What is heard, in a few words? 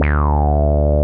JUNO FUNK D3; Drums In Your FACE!